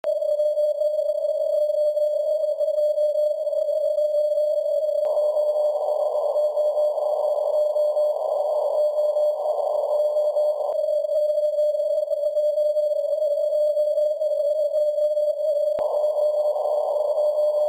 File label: bw var 600Hz low knee